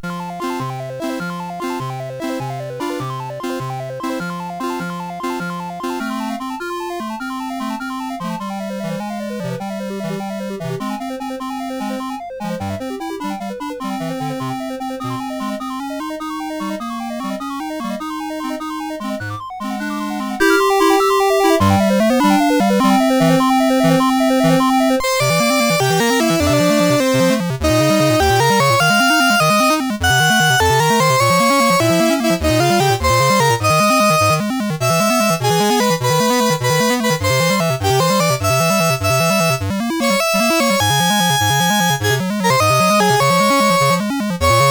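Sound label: Bird Song, MOS CSG8580R5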